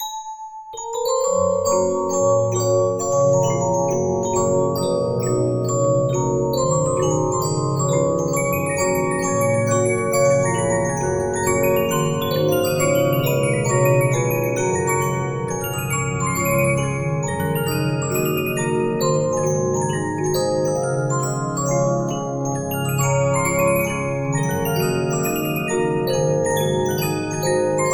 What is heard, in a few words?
Regina, 15inch disc musicbox